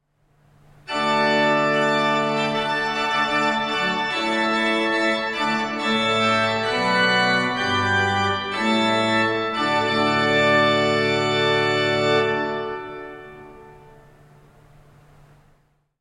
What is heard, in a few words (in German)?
Orgel, pfeifenorgeln; SW-Holzprinzipal 8'+Gemshorn 4'+Gemshorn 2'+Mixtur 6f